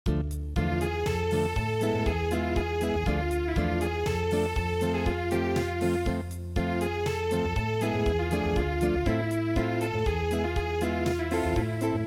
Unplugged

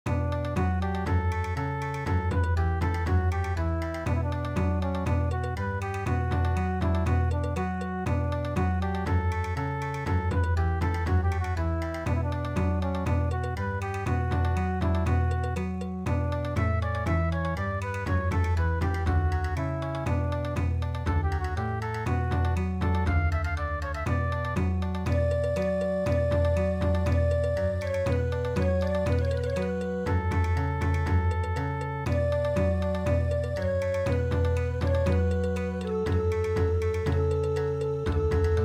Bon Odori

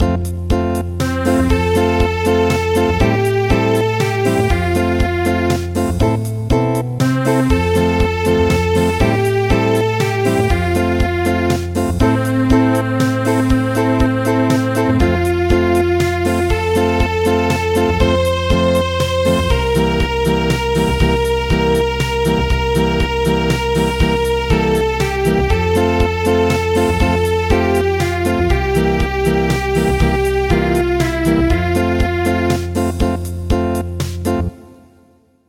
Acoustic, traditional

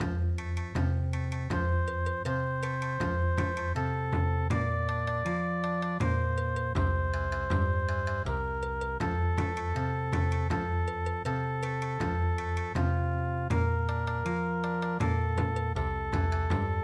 Bon Odori

